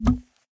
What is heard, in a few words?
paperlauncher paper gun